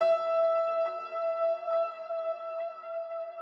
piano

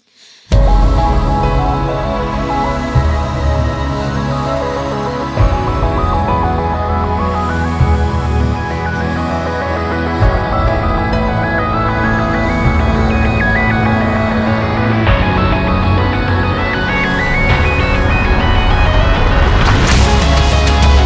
Sounding Beat

